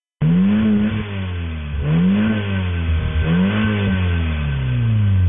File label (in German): 1300er 90 ps multipoint